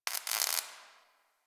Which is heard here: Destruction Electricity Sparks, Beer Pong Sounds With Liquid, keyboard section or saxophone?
Destruction Electricity Sparks